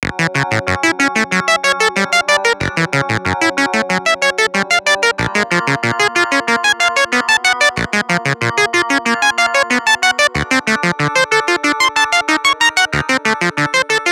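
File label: Climb ringtone; PlusFourSix; SE Sound Project; Lars Edvall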